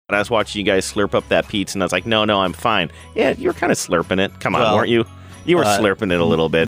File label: slurping up pizza